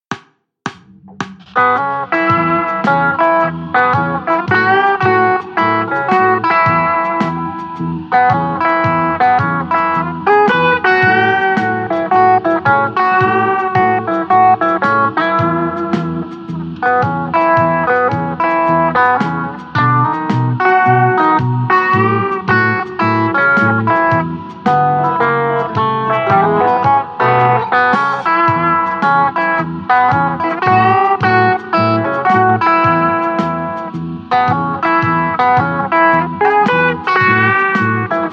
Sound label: Blues